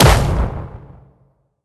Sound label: Hit; Linirea High Sorcerer